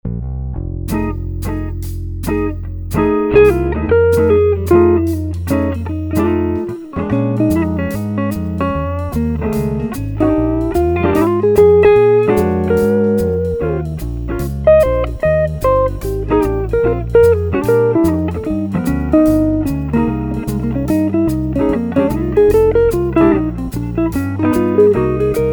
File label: Custom bluez clean, guitars